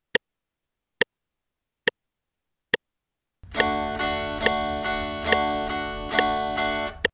Upstrokes downstrokes D, guitar

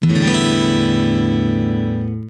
open strum, guitar

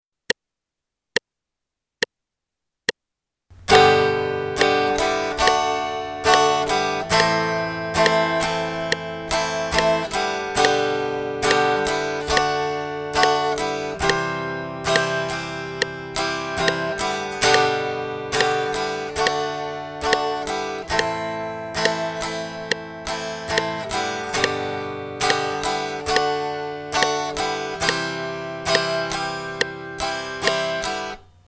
song With Open strum, guitar

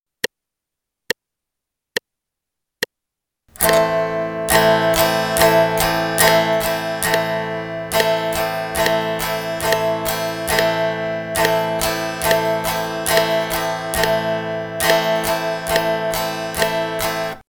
Am strum in eighth notes pattern, guitar

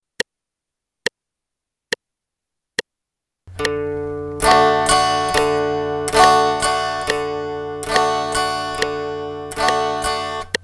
D bass note, guitar

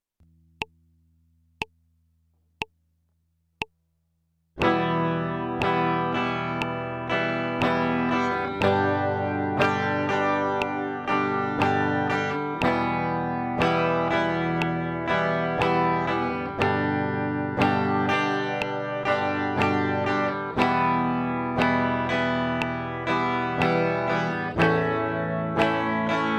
intro strumming, guitar

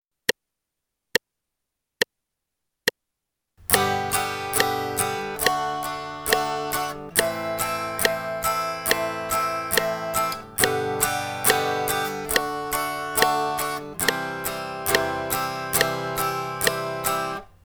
Upstrokes downstrokes song, guitar

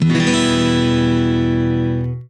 e min 022000, guitar chord

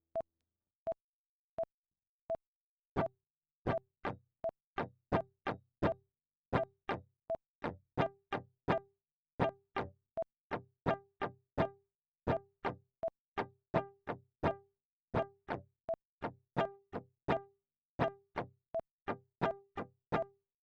Mute Strumming, guitar

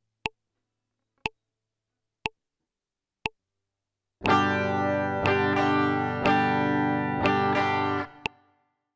intro melody g

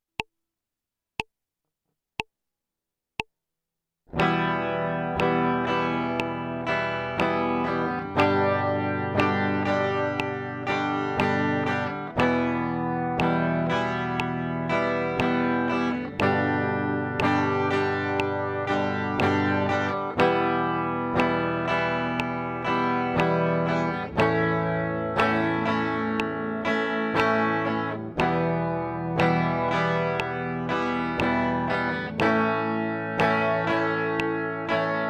intro strumming part2 full, guitar

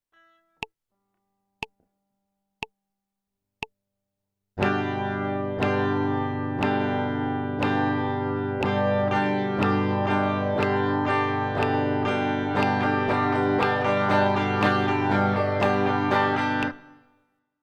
sixteenth note strumming g, guitar

